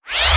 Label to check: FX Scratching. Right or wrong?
Right